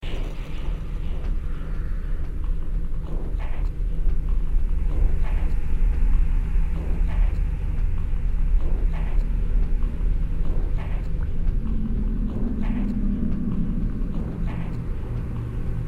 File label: Minigame Attack